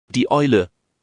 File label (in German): Eule; wildvogel